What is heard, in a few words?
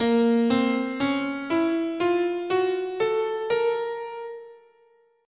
Asharp Hungarian Gypsy